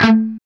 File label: CHUG A 2, Guitar; CHUGS; East West; Steve Stevens Guitar Samples Collection